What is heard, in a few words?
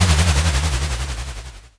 rolling rasp